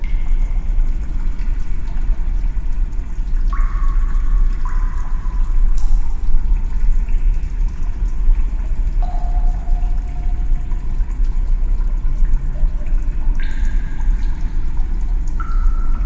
sewage

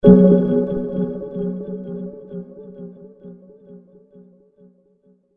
beep

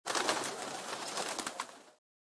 pigeon

